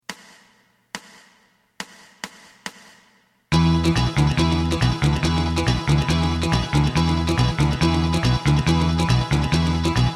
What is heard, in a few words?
bass